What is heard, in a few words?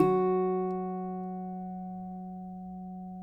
CUATRO F# 3, Guitars; Spectrasonics; Hans Zimmer Guitars VOL-2